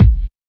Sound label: bass drum sample a sharp key